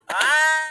fainting sound; mario hugo

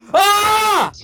screaming

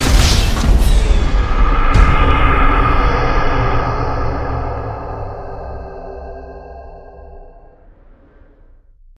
wasted; gta v

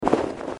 flag wave sfx

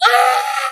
bird scream isolated; zach hadel

